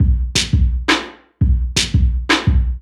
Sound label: Sparse Beat, Drum N Bass; Sonic Foundry (Sony Creative Software); Knutrix Drum & Bass